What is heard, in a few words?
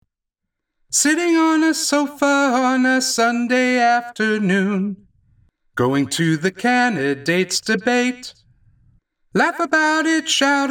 singing waiter